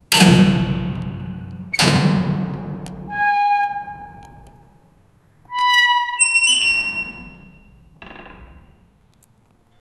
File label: old key opening an old